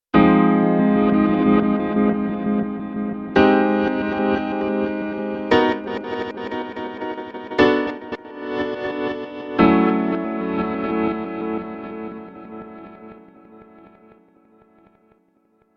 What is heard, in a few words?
Amb Piano; KOPRO+